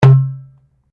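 Drum Darabuka Strike forte, Egyptian Darabuka Drum; Commanly available Egyptian Darabuka Drum; Musical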